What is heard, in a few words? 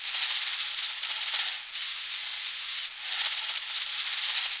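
percussion rain stick; Roland - Rhythm Section; Water Perc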